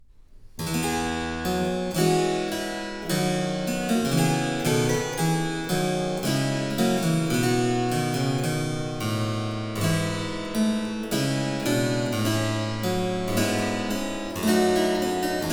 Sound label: Choral; Pierre Hantai; Bach; BWV 706; Liebster Jesu, wir sind hier